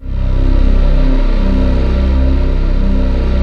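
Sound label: vox whisper C2; FAIRSWEEP; Synth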